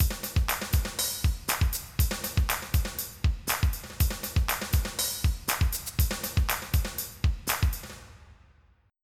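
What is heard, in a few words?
brush pattern; TWIDDLY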